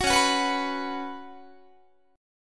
Db 7th Suspended 4th Db down Guitar; strummed

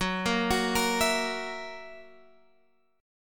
F sharp 7th, Guitar, Open G

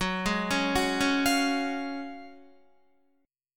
Gb Major 7th Suspended 2nd, Guitar; GbM7sus2 arpeggiated; JGuitar

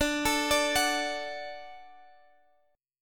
D Major D down Guitar Standard; D arpeggiated; JGuitar

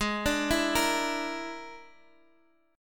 A sharp 7th Flat 5th G sharp

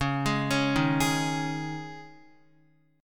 Db Suspended 2nd Db Guitar, Dbsus2 chord; arpeggiated; JGuitar

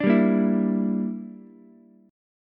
Bb 6th Flat 5th, Ukulele; Bb6b5 strummed

